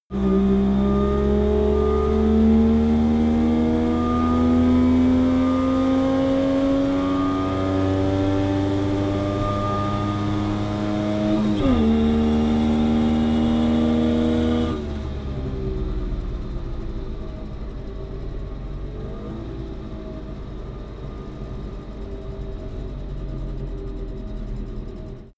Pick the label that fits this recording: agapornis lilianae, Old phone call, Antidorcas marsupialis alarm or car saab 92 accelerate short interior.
car saab 92 accelerate short interior